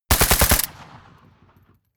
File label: rifle ak-47 exterior fire burst close; Firearms; schusswaffen